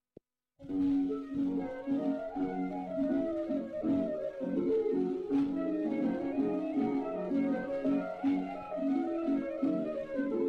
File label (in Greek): Σηλυβριανό Συρτό; 1928; Polydor (GER.)